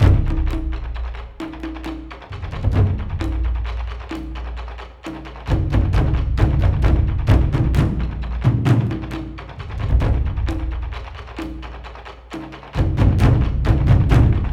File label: Drums; For Honor